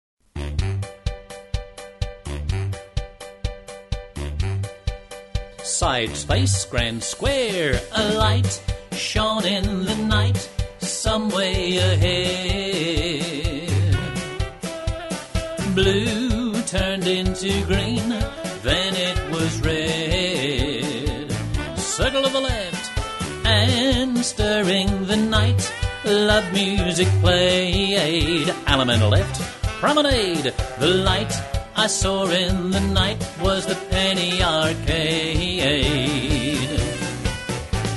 penny arcade vocal

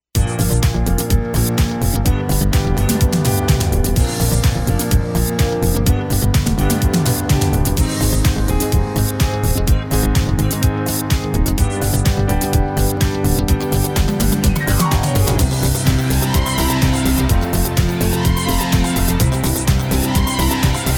jedi versus sith rhythm track, square dance music

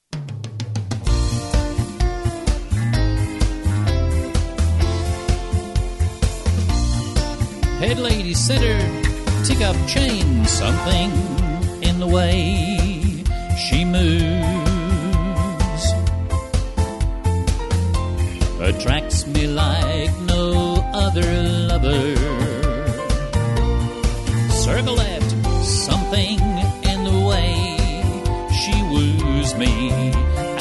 something vocal plus; square dance music

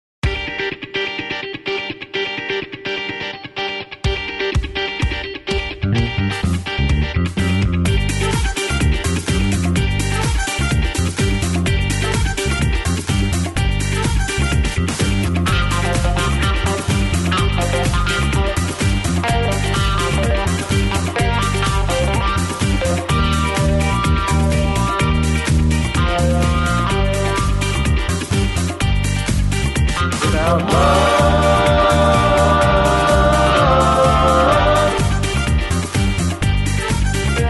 harmony vocal, square dance music

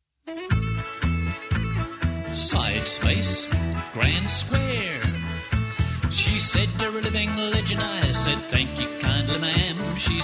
vocal, square dance music

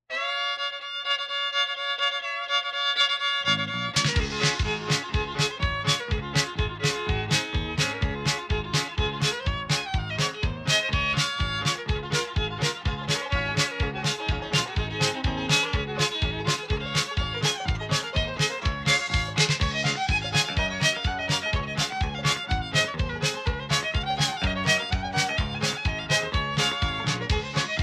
hoedown, square dance music

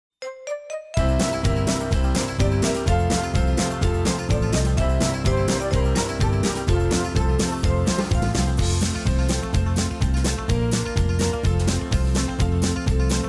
rhythm track, square dance music

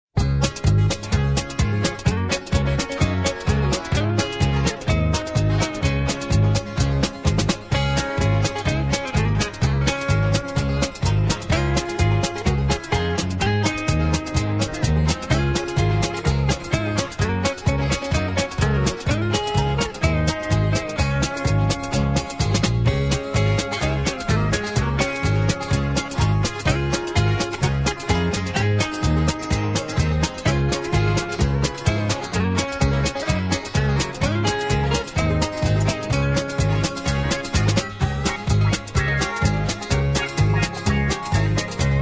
crawdad song, square dance music

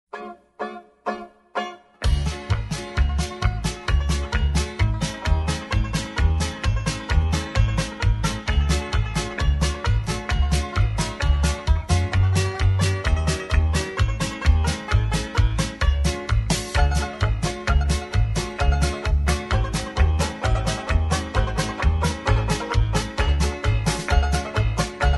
chinese breakdown, square dance music

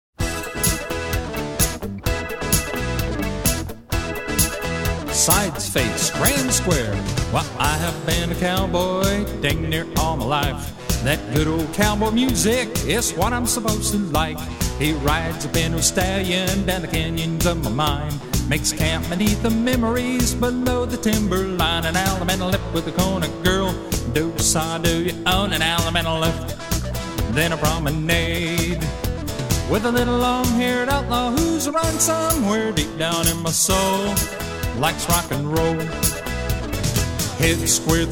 vocal; square dance music

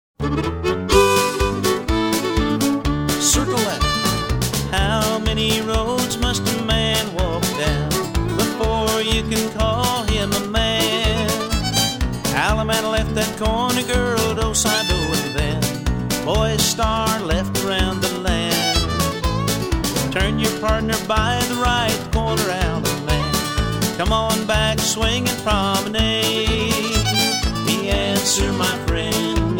vocal; square dance music

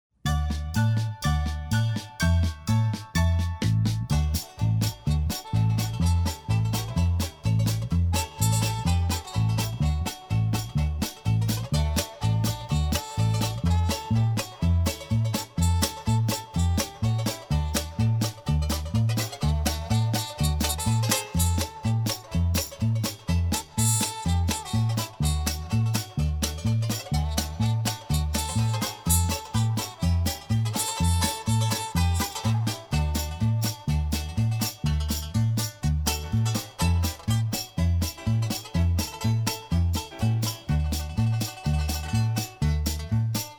chime bells, square dance music

